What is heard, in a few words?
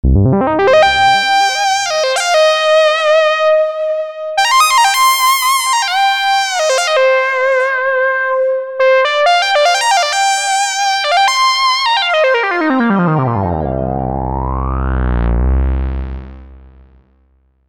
Mello Lead; Minimax; dinopark